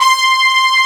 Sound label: BRASS, Brass Bones, Brs Layer; Club-50 - Foundations Roland